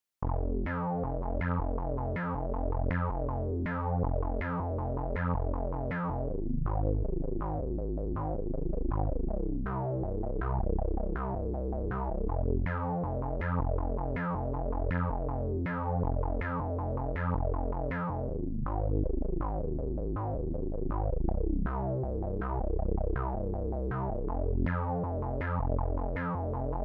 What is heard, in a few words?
Detuned Bass no FX Normal; TB Lowtone; no Lim 13 dBFS